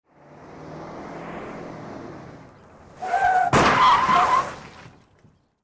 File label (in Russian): tormozhenie i udar